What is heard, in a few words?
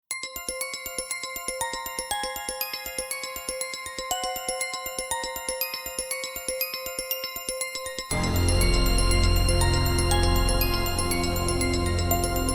suspense